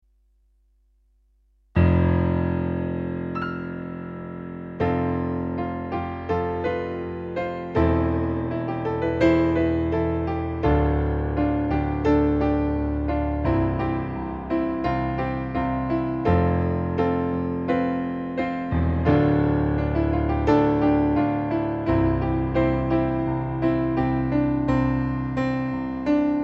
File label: Solo Pianist